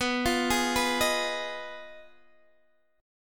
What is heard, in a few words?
B 6th Flat 5th B down Guitar DADGAD